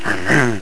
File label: New Game; Macworld (1999-03); Games World; Shareware Games; One Way 1.0